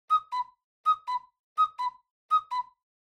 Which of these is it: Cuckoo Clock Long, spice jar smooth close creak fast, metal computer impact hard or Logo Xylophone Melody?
Cuckoo Clock Long